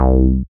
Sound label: SYN TB ORIG, Roland TB-303; Sound & Vision - Gigapack I CD 2